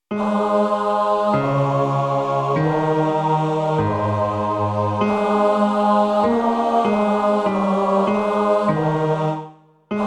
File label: bass